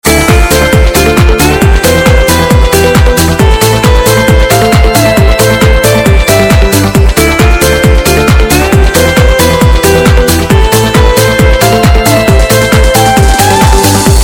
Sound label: Loop